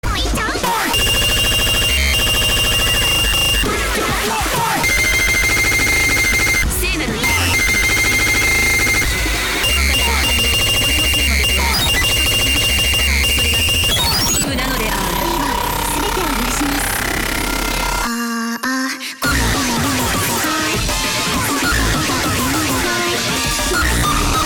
200bpm kicks

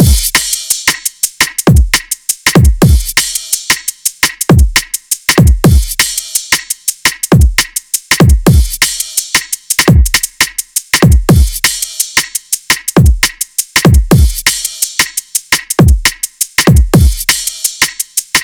Drum Beat 170bpm Em, Jungle; NEWJUNGLE; Zenhiser

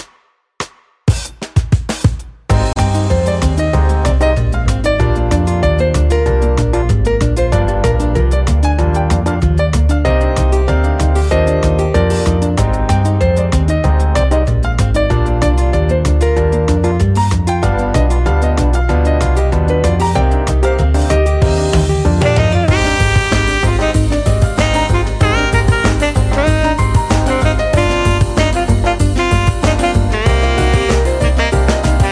Jazz Funk Mix

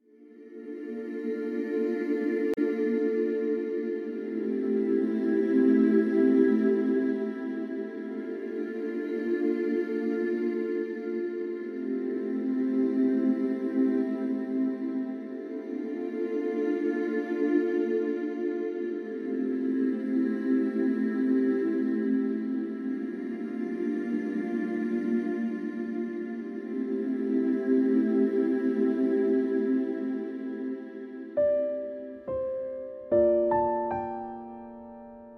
Piano Version

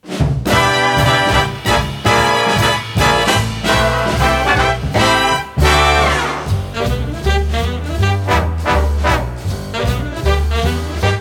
Gentle Forest Jazz

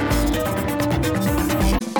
trance stuff

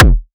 kick; Rialasten 2022 Xmas Sample Pack; 2022 Xmas Packs

